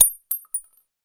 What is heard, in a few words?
CASING 7 62 mm Hard Surface